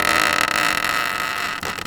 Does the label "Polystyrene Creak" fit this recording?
Yes